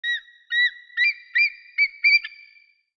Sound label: temple eagle